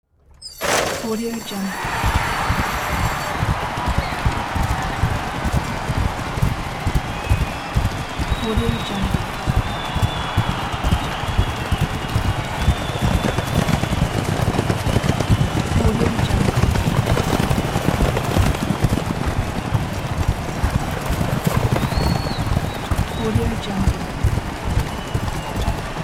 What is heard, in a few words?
horse race